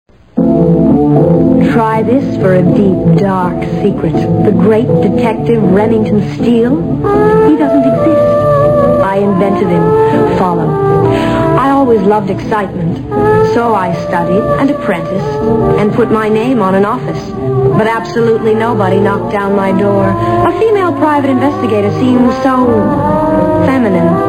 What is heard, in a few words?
TV Theme, with voiceover